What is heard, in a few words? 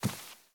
Step Slippers